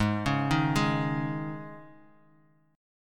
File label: Ab Suspended 4th; Absus4 arpeggiated; JGuitar